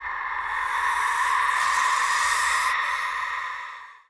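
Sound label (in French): serpent; El Rey Arturo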